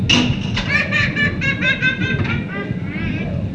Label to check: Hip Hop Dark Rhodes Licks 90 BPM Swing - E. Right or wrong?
Wrong